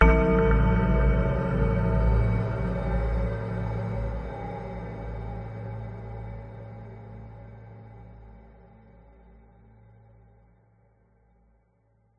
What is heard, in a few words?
horror hit ghostly bell like chime dark pads sinister evil dark